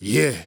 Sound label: Dry Chant "Yeh"; Cymatics; Euphoria Vocal Sample Pack; Chants